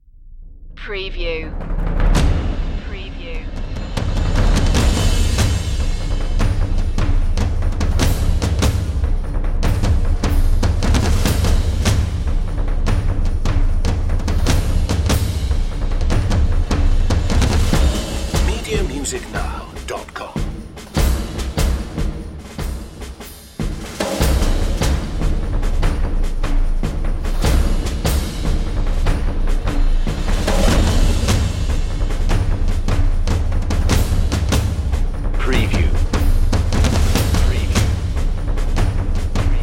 battle drums

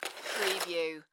Snow and Ice, Ice skate slide; Weather sound effects

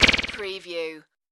arrow flutter impact

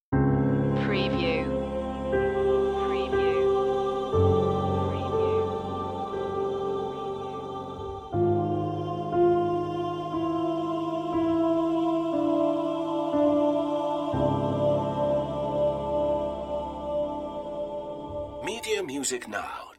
sinister halloween twinkle twinkle

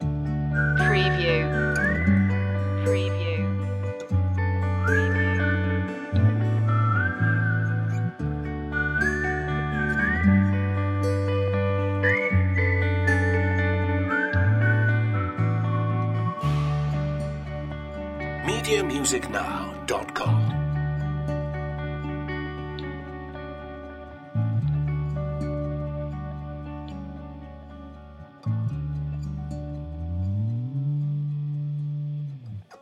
simple thanks loop

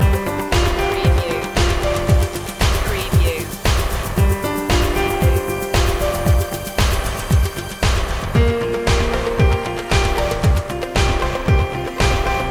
corporate trust loop

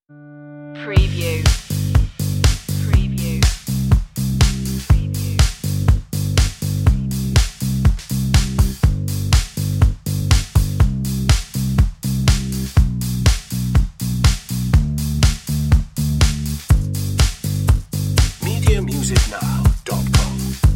hopeful drums bass

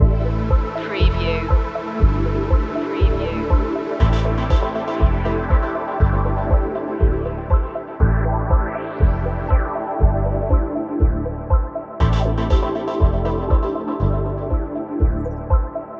light spin loop

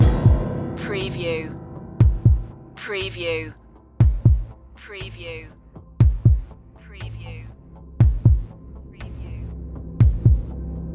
tick tock tension

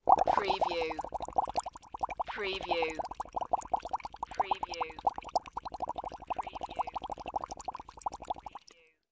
Bubbles Straw Blow; sound-effects-bible

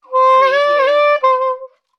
Sax Smooth Riff

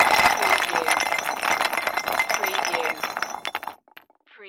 Debris Rock

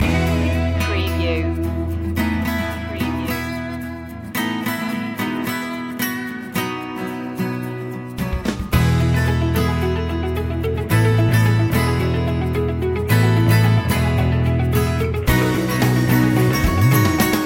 motivational living loop

